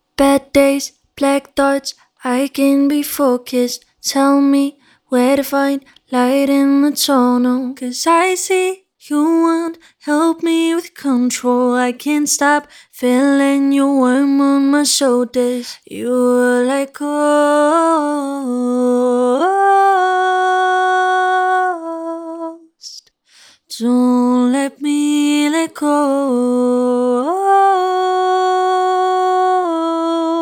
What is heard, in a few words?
Vocal Lead, Bm; RAW